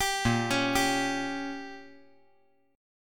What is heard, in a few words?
A sharp Minor 6th A sharp down, chord; arpeggiated; JGuitar